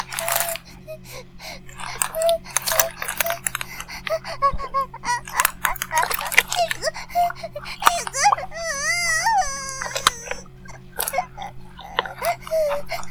バイブ放置, ASMR

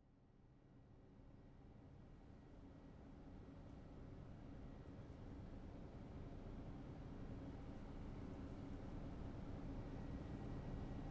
キッチン シンク の 大掃除（ケミカル スプレー、歯ブラシ で ブラッシング、ウエス で 拭き取り)

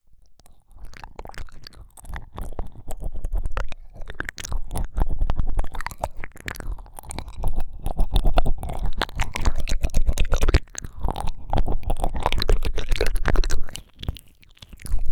奥まで激しい耳舐め, ASMR

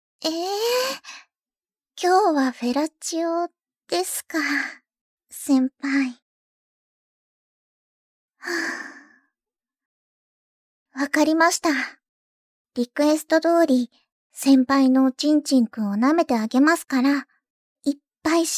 フェラ; フェラ&お掃除で幸せに

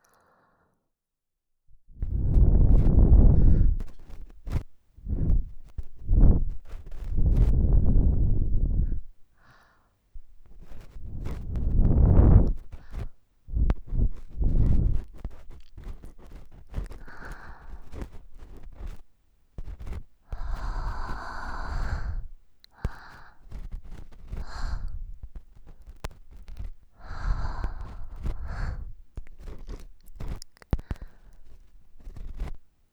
耳ふ～(左右・耳かき音）

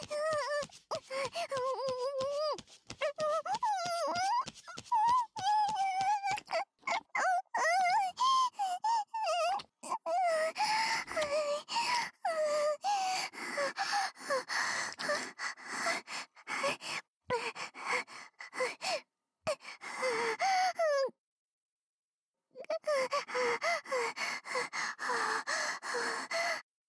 イキ声

